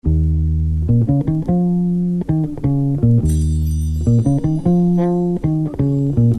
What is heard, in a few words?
BASS